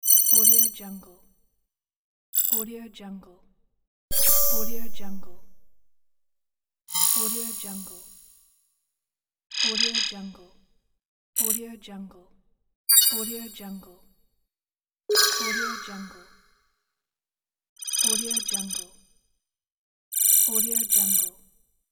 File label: user interface pack